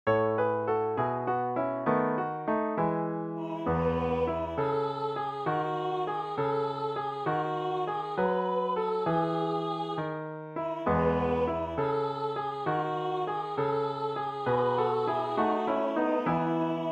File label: Glorious Bells Ring